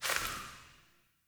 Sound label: Sparrow Death State One Wing Flaps; Animals